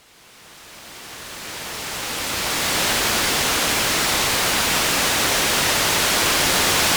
brush, Percussion, Swish

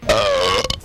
burp strained

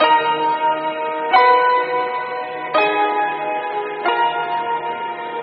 piano angry chords; Loops; Sad

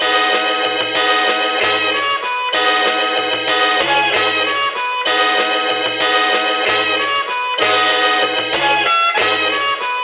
keys abrupt

